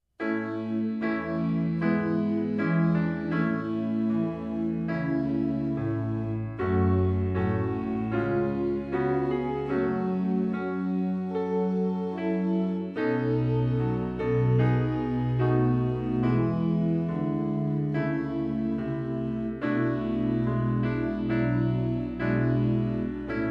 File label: SDA HYMNAL SONGS TUNE